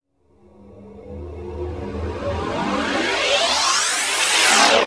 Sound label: SQUABBLE, Trance; ILIO; Trance Fusion; STOP LONG